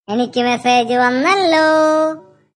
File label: message